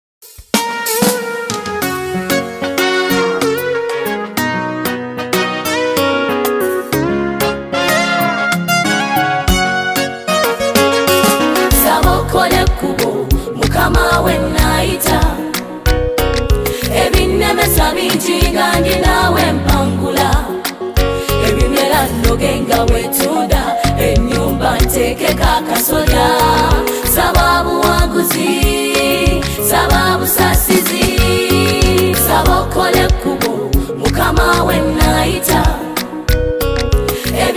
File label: choir